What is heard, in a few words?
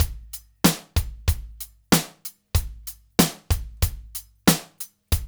DRUMS; East West